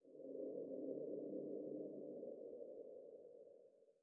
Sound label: Nondiagetic Ambience